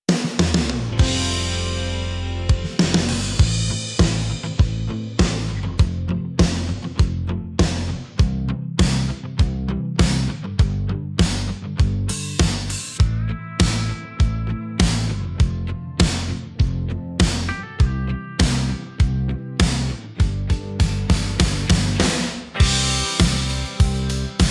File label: instrumental